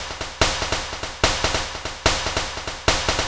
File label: 146 Techno Percs Snare; Dance DJ; Drums; Pro Tools Essential Loops; Digidesign